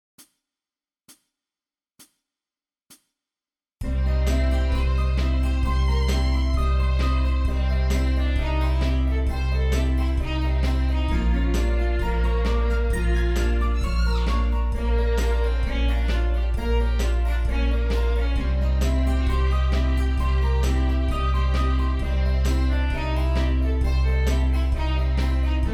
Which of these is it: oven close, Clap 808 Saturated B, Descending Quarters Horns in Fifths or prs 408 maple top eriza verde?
Descending Quarters Horns in Fifths